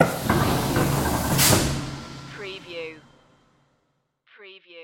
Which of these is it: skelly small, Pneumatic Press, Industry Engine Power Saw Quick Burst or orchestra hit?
Pneumatic Press